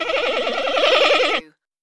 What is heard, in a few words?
creature talk